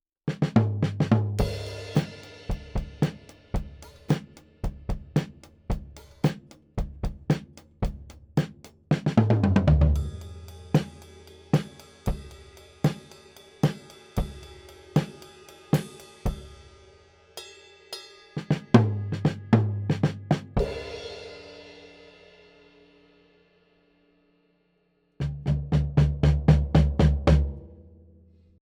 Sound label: Mono Overhead Drums; Nohype LRM V; MixPre6